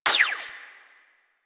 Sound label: Fire Shot; Burston Prime; Warframe; Weapons